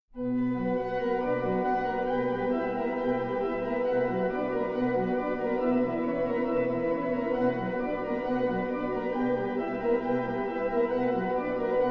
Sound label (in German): Toccata H-Moll